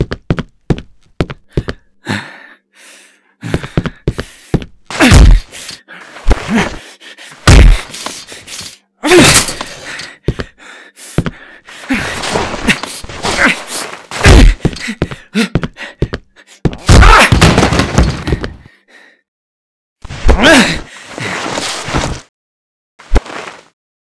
strangle sounds

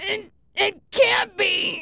death; trunks kid

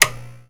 button

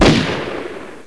ggun fire

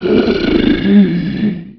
zombie die, male

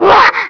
small jump; ghouls forest2; smalljumper

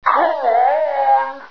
grunt idle; hgrunt2

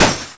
lamp exploding

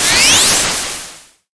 holy sword up

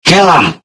attack, paralyzed subject

